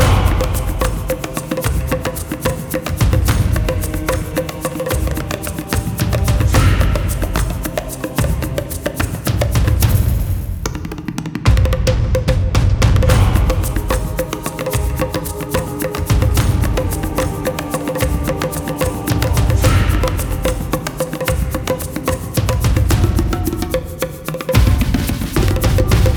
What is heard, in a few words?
Garden Main LOOP